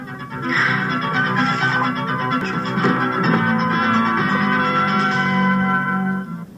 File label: scene ender drama